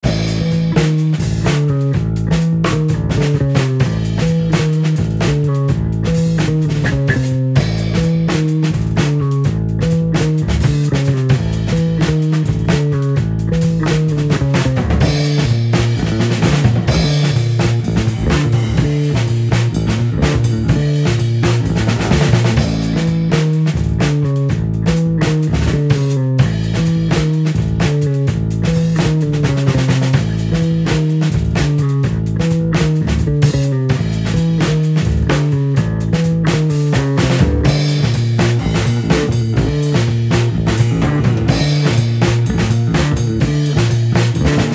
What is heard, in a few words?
d&b; Cave Canis